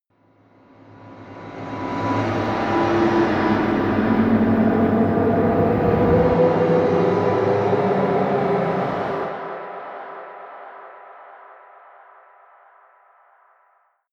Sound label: ghost choir transition, risers